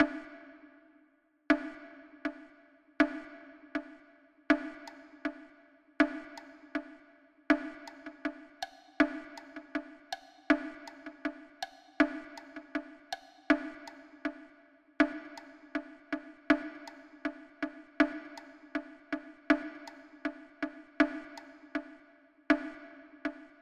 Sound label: drum